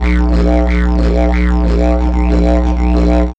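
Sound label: DIDGER, didgeridoo; E-Lab; X Static Goldmine 1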